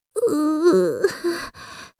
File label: gonome idle